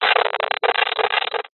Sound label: science fiction radio signal interference burst